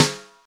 snare one shot c sharp key, Royality free snare drum tuned to the C# note. Loudest frequency: 2654Hz